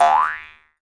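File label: Jaw Harps, Pitched Percussion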